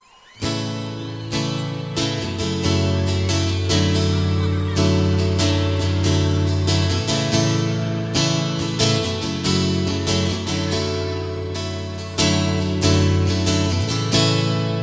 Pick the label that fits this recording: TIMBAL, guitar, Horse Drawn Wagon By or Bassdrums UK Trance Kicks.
guitar